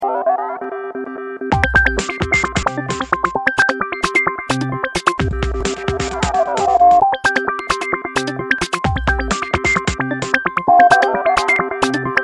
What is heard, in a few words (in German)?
iphone klingeltoene iphone delightful